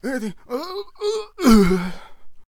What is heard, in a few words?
Traveller Death Vocals